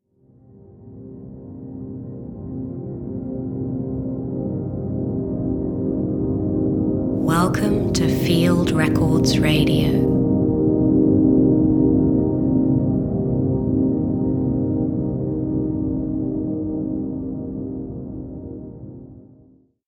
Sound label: Radio Jingle; Field Recording